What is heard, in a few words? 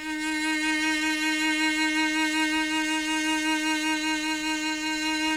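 chamber cello; Sonic Reality; Vintage Timetraveler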